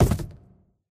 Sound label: phys cube, physics